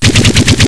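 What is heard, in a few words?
machine gun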